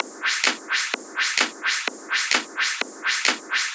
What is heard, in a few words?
tops 128; Anthemic.Electro; tops loops